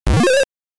Monster Blown Out; Dig Dug; arcade

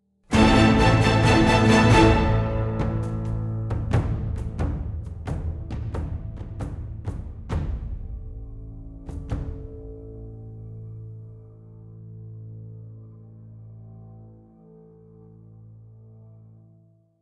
Music Battle

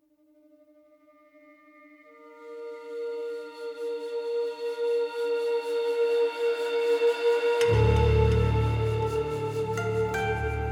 music intro; 1-2 area 1

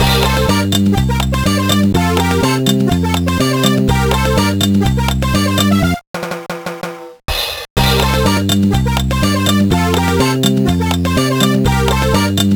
Bowling Menu; Super Monkey Ball Jr.; GBA

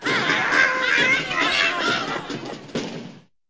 level start military; Angry Birds Seasons

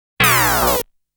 Shield removal

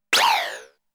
Firing Sound; Namco Game Sound Express Vol.1; Valkyrie no Densetsu

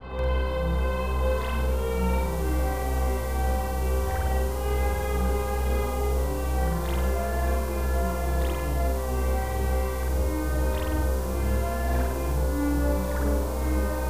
Bassoon

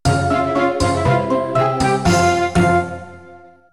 medium task; Seers' Village

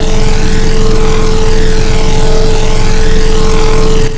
Engine Tun Gear

